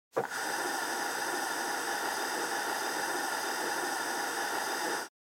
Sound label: Gas stove gas leak hiss; cooking